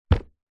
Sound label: musical violin in case set down on ground